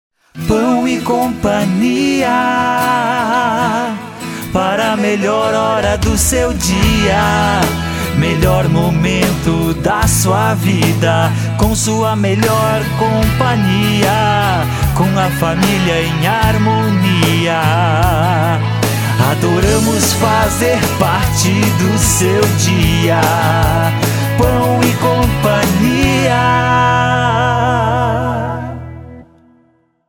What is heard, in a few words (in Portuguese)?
Masculino, jingles comerciais; Pao e Cia